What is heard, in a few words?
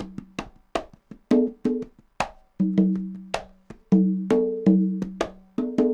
cong bongs 80, latin, 80bpm